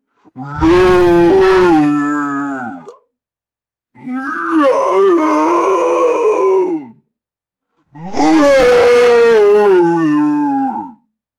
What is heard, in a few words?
large creature wailing and groaning; creatures